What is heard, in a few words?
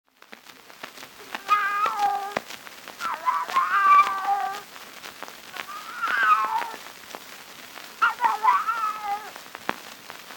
Animal noises cat chicken rooster cow bird followed by announcement; Cylinder Preservation and Digitization Project